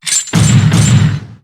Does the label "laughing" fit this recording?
Yes